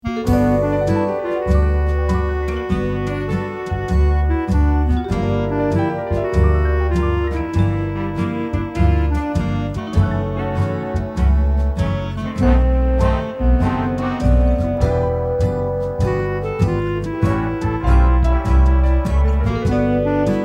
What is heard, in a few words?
Soft Clarinet Ballad